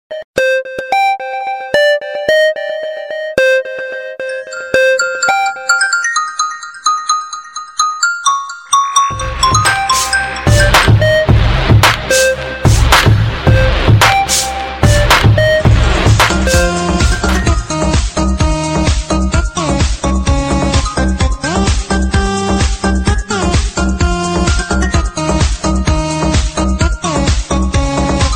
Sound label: ringtone; latino